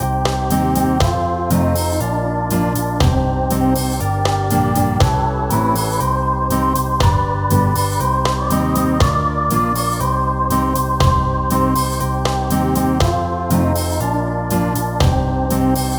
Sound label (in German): Jesu meine Freude Reggae